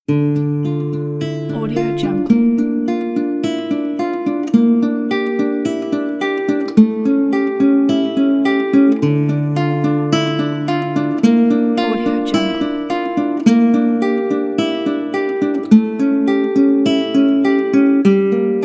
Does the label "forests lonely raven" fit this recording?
No